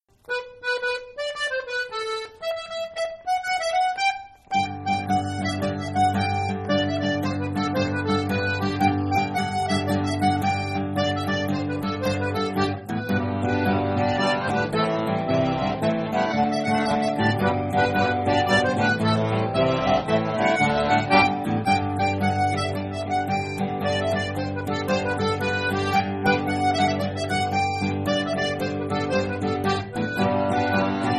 Maraichine; Roule ta bosse

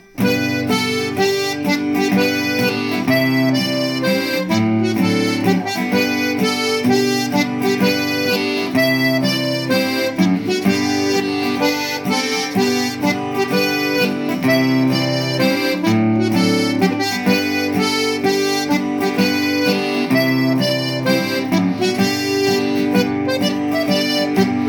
Mazurka Limousine

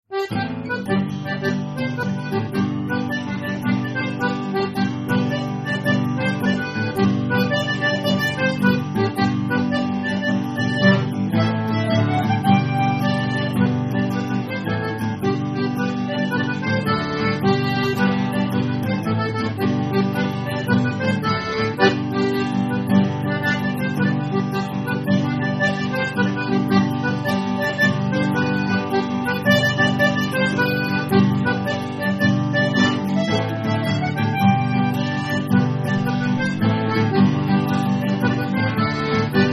Instrumental; Je m'suis leve de bon matin

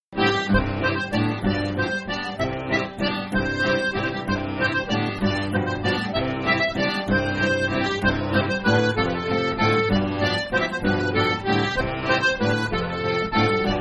Bourree; Nous voici a paques

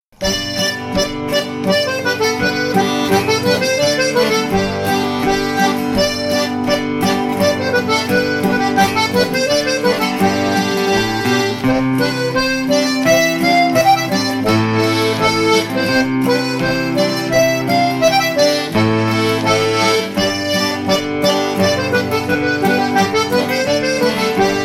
Branle de Bourgogne

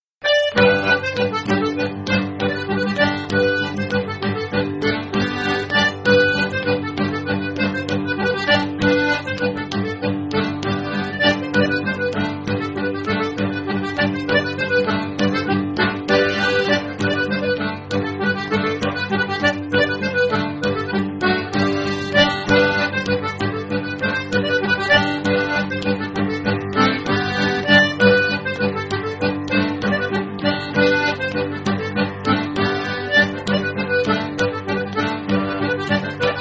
Bourree; la cornadela

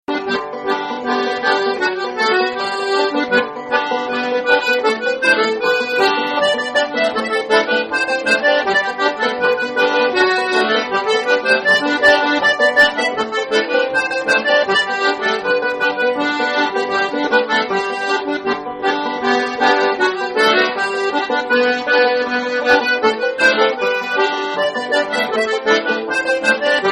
L Anglaise duo

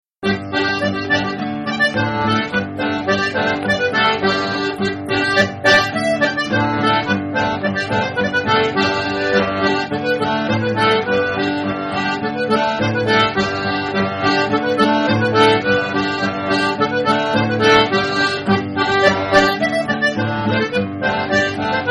Maraichine; les p'tits poissons

